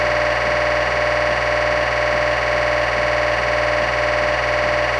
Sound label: mri scanner sound